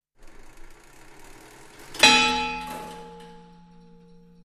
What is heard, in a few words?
Clock 10 Tower 19th C, bell towers